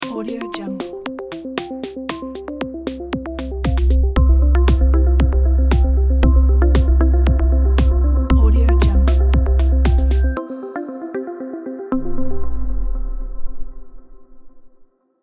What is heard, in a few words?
motivational logo